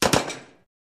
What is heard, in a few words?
Machine Gun Shot 2x; bursts